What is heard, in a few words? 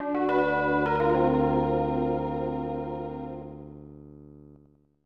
Failure Chimes; Cu-On-Pa; SNES